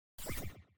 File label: Si Fi Retro Game Lazer